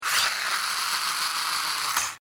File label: Servo Mechanical SciFi Robot Toy; Scifi Servo D Mechanical Robot Small Motor Toy; BBFX